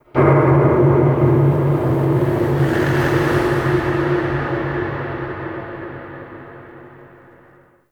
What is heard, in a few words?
Champion Hub Texture, Ambience Dungeons Under City Champion Crypt Hub